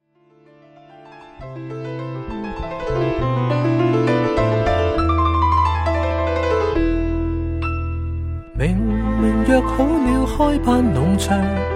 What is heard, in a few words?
Piano Excerpt